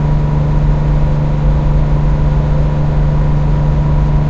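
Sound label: Valentine engine interior rpm load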